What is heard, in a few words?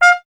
trumpet; Miroslav Vitous; Solo Instruments VOL-1